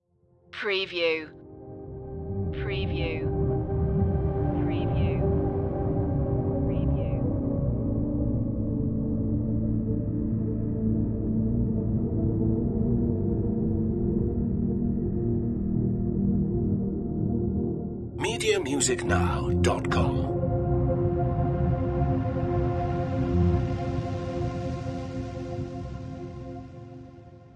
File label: underscore tumbling